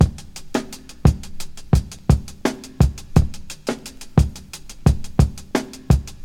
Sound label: 116 bpm drum beat g key, Free drum groove - kick tuned to the G note